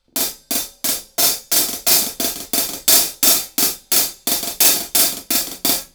hihat

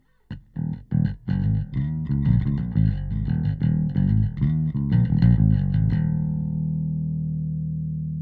bass bypass; FirePresser

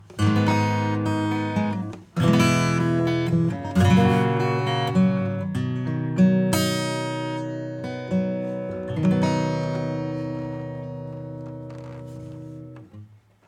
Acoustic

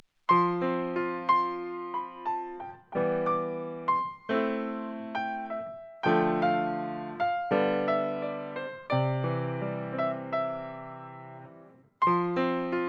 bypass piano

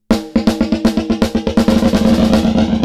FLANGER; Cluster Delay

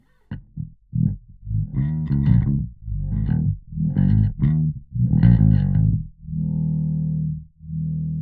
Morph EQ bass LP SWEEP

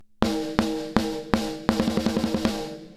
snare INPUT 8 ANALOG off; Lindell 7X-500

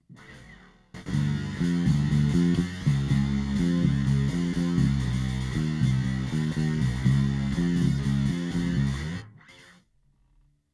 flang

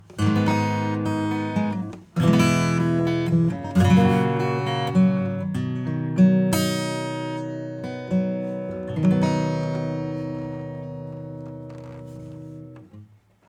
acoustic bypass

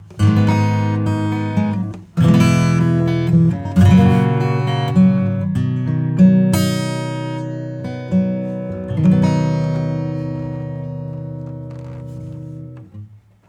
acoustic

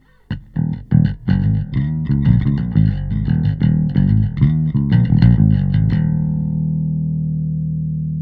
bass Thumper; PHYSION Mk II

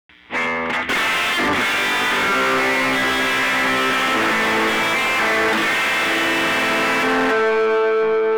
tube delay wet drive

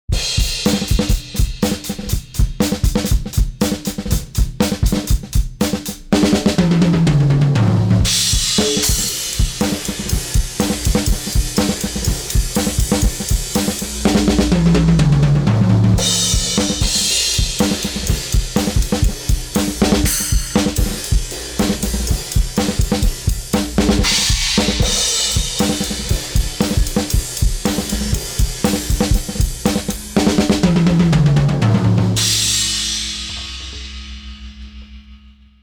EQ Tone Stack Low cut; Kirchhoff

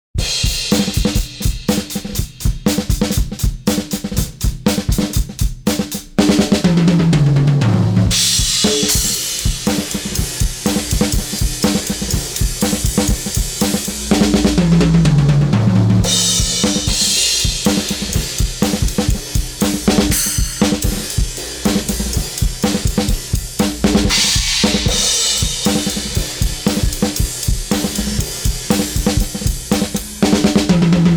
EQ Low Shelf cut; Kirchhoff

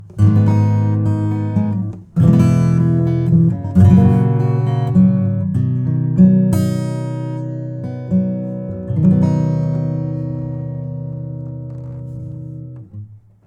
acoustic EQ MID HIGH cut; Joe Chiccarelli

